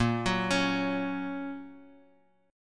A sharp Minor Sharp 5th, down, Guitar, Open G

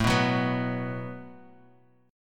Gb Minor Double Flat 5th A, guitar

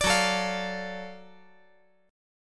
F sharp Minor Major 7th Sharp 5th F sharp down

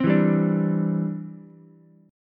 E Minor 7th Sharp 5th, Ukulele; 5,4,3,5; strummed; JGuitar

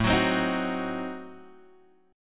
A Diminished 7th A down Guitar Drop D

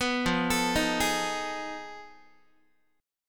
F 7th Sharp 9th Flat 5th F down Guitar; F7#9b5 arpeggiated

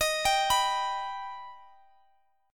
Eb Augmented, Guitar DADGAD; arpeggiated; x,x,x,20,22,21

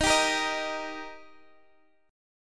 D sharp Major; 4G,5C,5E,5A; chordshape; 20,19,18,18

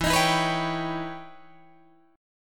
E Minor Major 13th E Guitar DADGAD; EmM13; strummed

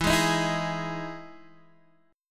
E Major 7th E down Guitar DADGAD

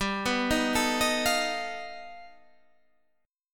G Augmented 9th, Guitar, Standard; G+9 arpeggiated; JGuitar

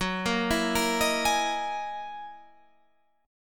F sharp 13th F sharp Guitar Standard; arpeggiated; JGuitar

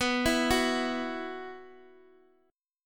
B Suspended 4th; x,11,12,9

